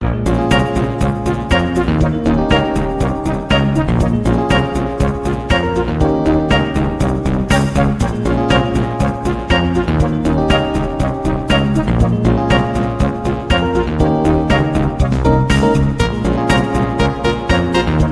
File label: Item Shop; block breaker deluxe 2